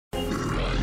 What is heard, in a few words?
run zoom